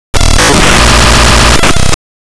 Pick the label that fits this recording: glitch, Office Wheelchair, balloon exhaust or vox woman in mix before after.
glitch